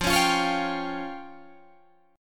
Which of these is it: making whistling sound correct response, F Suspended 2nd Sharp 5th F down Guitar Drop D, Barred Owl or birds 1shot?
F Suspended 2nd Sharp 5th F down Guitar Drop D